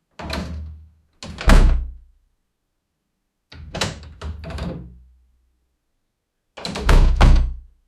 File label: unlocking wooden door sound